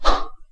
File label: throw